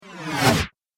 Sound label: Cinematic Reverse Whoosh; Whoosh Sound Effects